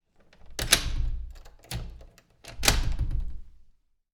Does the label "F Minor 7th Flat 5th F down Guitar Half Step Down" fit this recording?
No